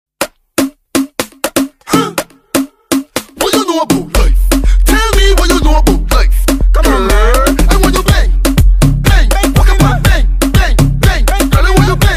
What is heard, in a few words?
soca, riddim; 2019 soca; barbados